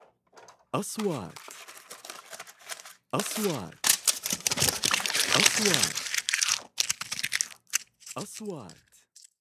Metal paper protector; kitchen

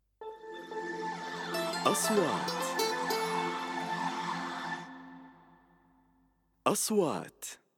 intro gt

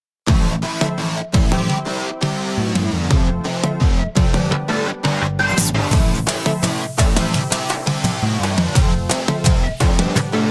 advertisements

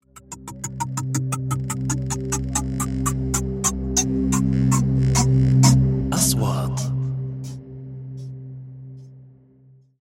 comb

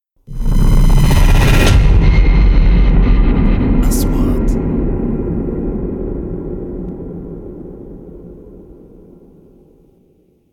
3D SFX Triler; مؤثرات صوتية

